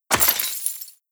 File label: debris pottery, bullet impact debris